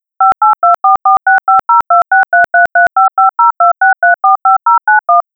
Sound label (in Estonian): telefoni